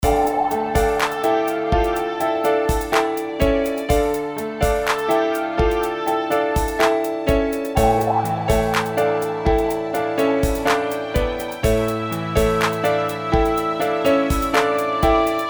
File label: loop piano strings air light drums reso fx; songsnacks